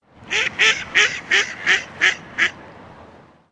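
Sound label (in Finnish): sinisorsa, lintuäänet; Vesistöt; BirdLife Suomi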